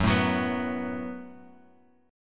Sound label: F sharp Minor Double Flat 5th, Guitar, Open G